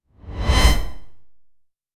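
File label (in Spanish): sonido rapido de transicion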